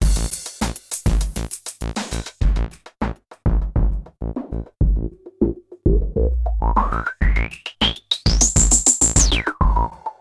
redsound darkstar filter filterinput

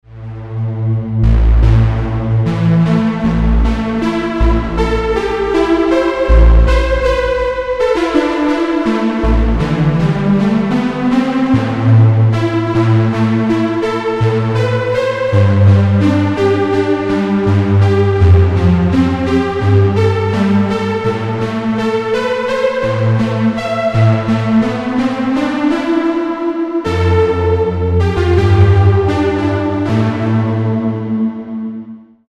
korg z1 sounds

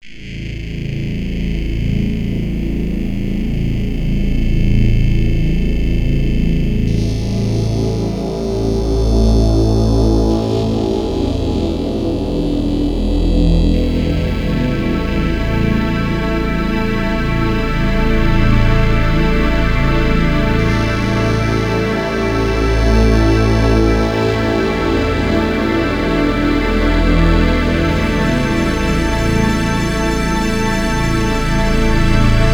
kawai k1r sounds am-pad

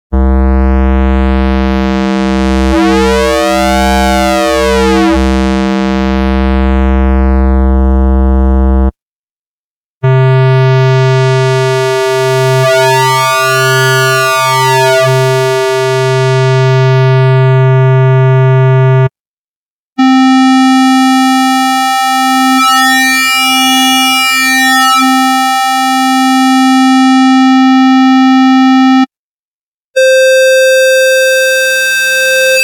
hohner hs-2 e sounds sin to saw phase distortion

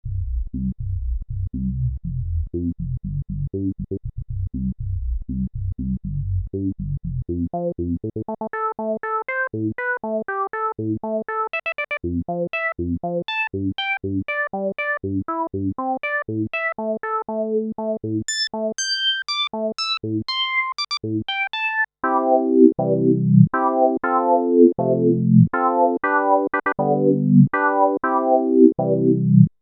yamaha ex5r filter lpf24a q100

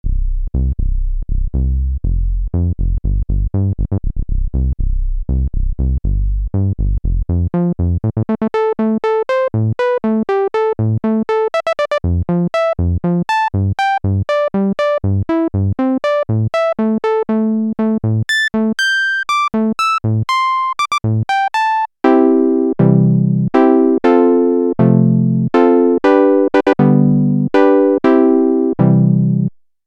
access virus c filter lpfa18 q000

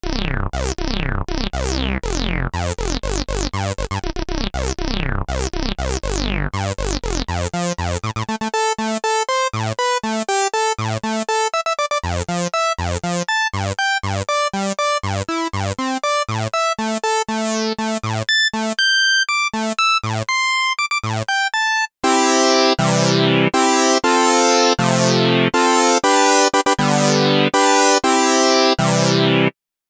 ensoniq fizmo filter polymod sweep

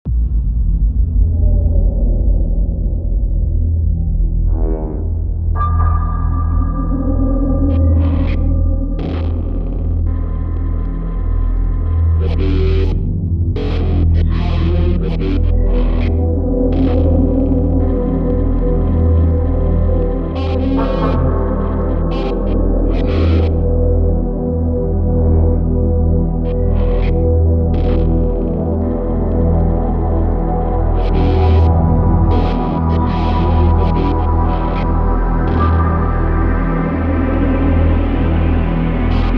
korg wavestate pure

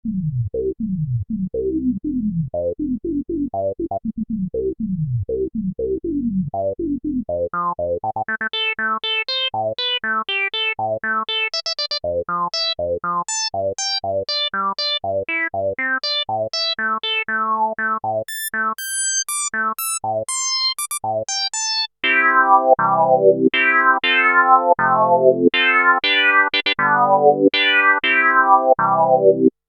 e-mu virtuoso 2000 filter classic lpf 4pole q100

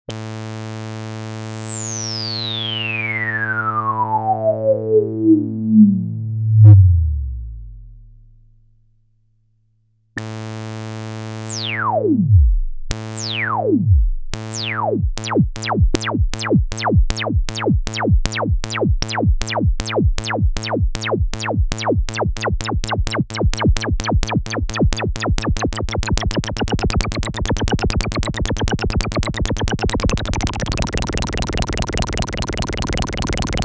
casio xw-p1 sounds, lfo on filter; Casio XW-P1 HEX-Layer Synthesizer; LFO On Filter LPF max Reso

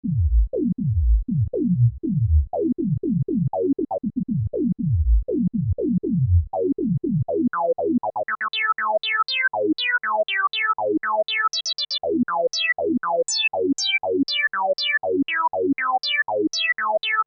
roland v-synth xt filter 2xtvf24db q075

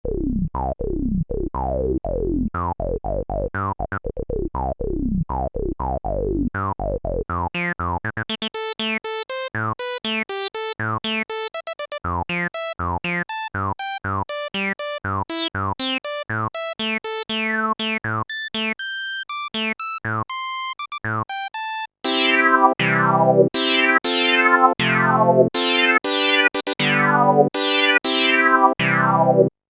alesis micron filter lpf 2pole q100

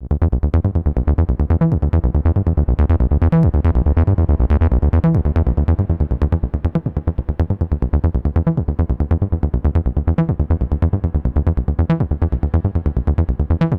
clavia nord modular kb 303, nord 303 external fx

